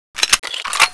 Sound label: gun switch burst; alyx gun